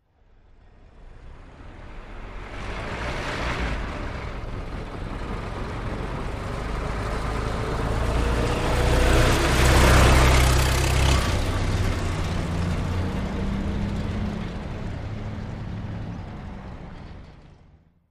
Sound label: Model-t, By; Drives In With Quiet Rumble, Gear Shift, Airy Atmosphere. In, By And Away.